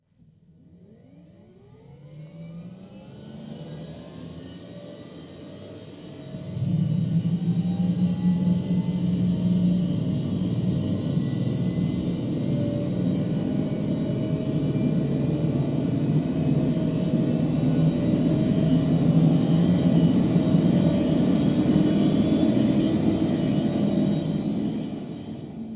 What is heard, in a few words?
H145 Starter left; ec145